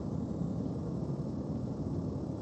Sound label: Ambience Loop, outdoor